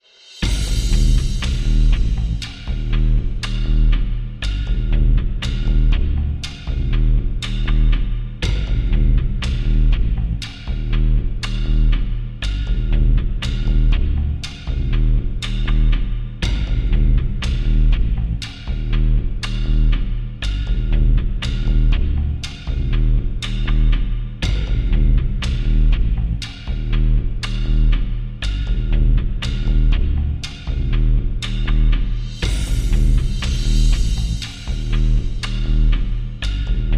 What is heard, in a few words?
Divine Drone Bass & Rhythm